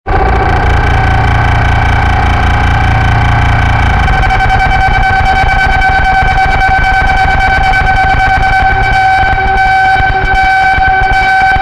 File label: deranged morse